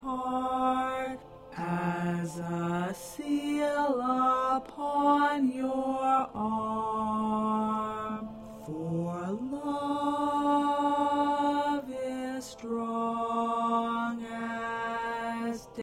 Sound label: Tenor 1B